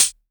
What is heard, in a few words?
hi hat closed, Korg Electribe ER-1; Kit 17